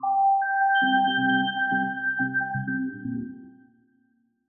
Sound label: glitch C maj; fx; bespoke - Floral v.1